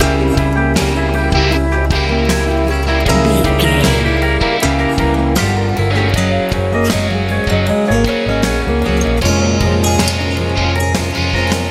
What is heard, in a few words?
country dreamy